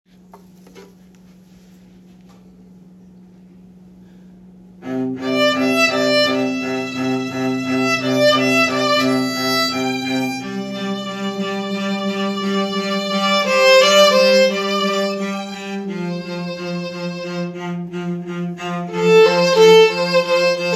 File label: Cello Violin; wedding; Ceremony & Cocktail/Dinner Music